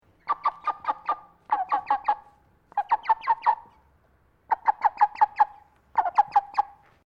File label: Bird Chicken Koeyoshi Clucking Sequence, Chickens in northern Japan; Animals; Birds